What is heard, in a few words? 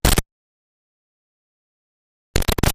Quick Static Pop